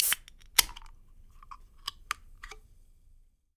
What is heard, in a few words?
CAN OPEN, KITCHEN; 1000fx